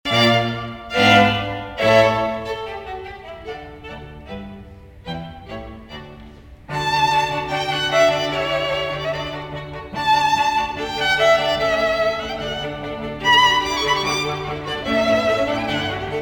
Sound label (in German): Streichquartett; Mozart Presto